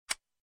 Camera Off

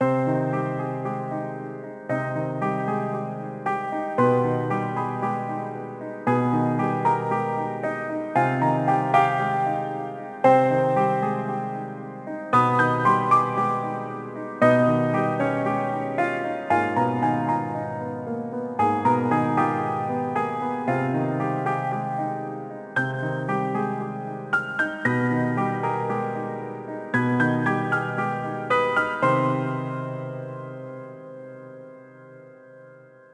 Distressed Piano Duet

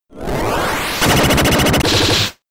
Hyper Beam; Pokemon; Gen 5; Blk Wht Blk2 Wht2

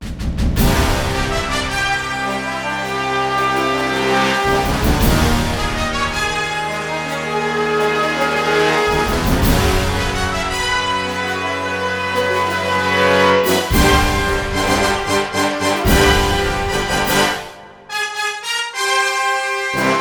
Fanfare Fra; Champion Jockey: G1 Jockey & Gallop Racer; Xbox 360

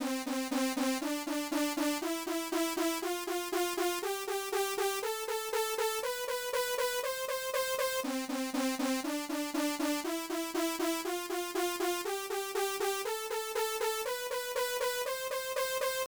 Sound Scale Check; Racin' Force; arcade

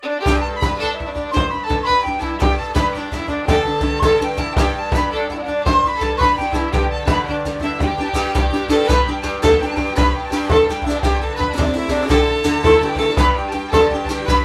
Orchestra Ambience; Assassin's Creed III

